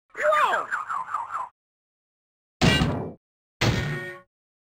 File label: normal death; Crash Bandicoot